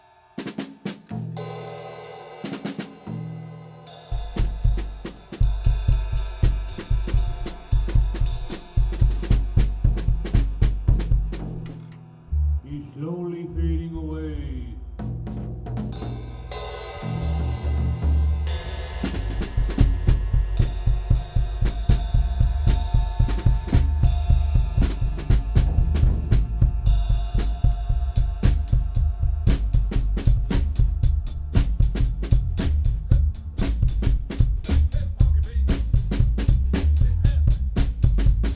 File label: Slowly Drum Jam